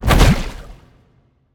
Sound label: Cast Ice; smite; merlin